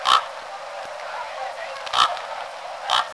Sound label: quorks, corvidae